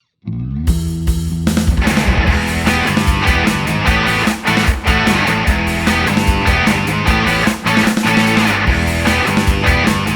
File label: Rock Cover; Super Mario Odyssey